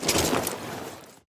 naked, ledge grabbing Vaulting; Ledge Climbing Sounds Redone

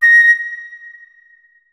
flute notes; Hideout Furniture

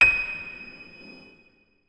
notes, keyboard piano; Hideout Furniture

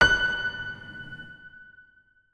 notes, keyboard piano; Hideout Furniture

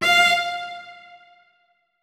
notes, keyboard; Hideout Furniture

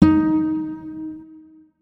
notes, guitar; Hideout Furniture

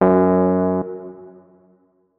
notes, keyboard, electric piano

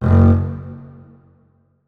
notes, keyboard; Hideout Furniture

